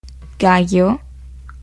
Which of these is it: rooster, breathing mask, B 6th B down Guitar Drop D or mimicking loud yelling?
rooster